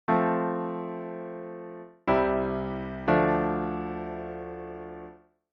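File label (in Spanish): Cadencia Rota V A Vi 6 F; auditivo de cadencias autenticas plagales rotas y semicadencias; Identificar la cadencia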